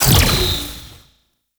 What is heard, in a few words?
Void Dagger Proj Shot, melee weapons; Warframe; Zariman Dagger; Void Mode